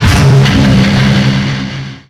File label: tank start; tf